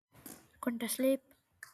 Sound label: sleep; quintus